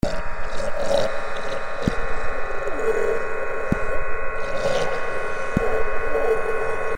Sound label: Amb; Spooky's Jumpscare Mansion HD Renovation; ps4